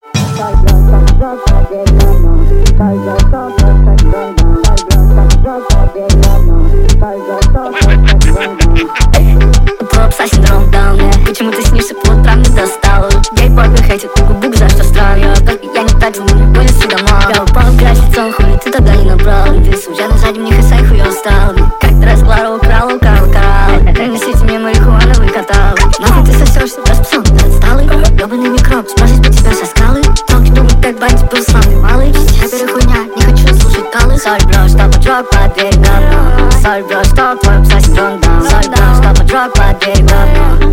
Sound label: instrumental